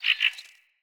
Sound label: Flock Attack Dive; Powers; Fey